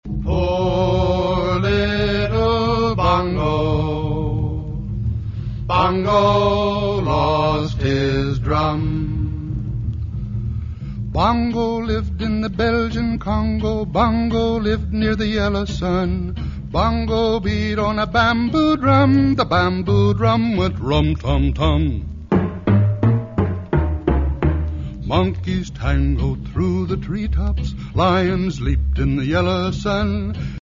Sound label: Baboon Drum